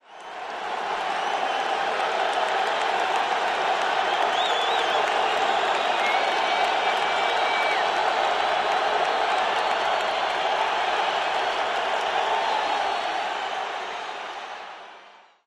CROWD CHEER

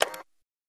Thread Mechanism, dat machine

